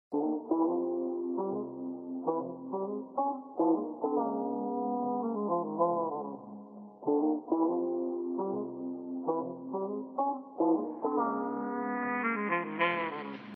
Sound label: detective tune; made with Voicemod